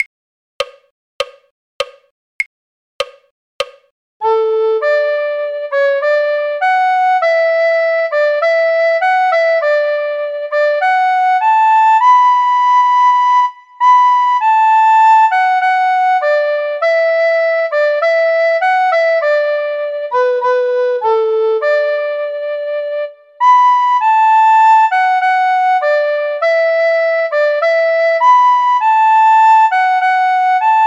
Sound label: recorder; auld lang syne; folk